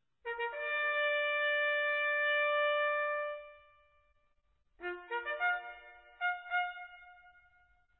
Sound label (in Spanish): derecha, corneta